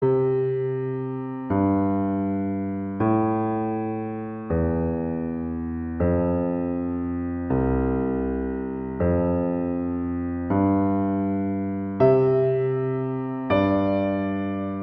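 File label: Canon in D, Violin; Valentine Pf Beginners; Pachelbel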